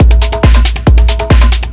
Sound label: drm 138, Techno, drum-loops